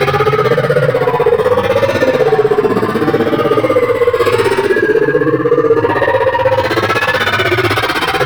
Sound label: Absorb Loop; Warframe; Stalker Assassin